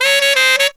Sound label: 160 BPM, Horns